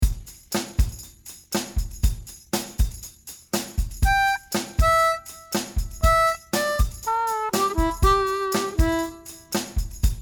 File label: harp